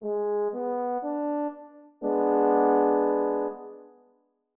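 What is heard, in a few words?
triad diminished G3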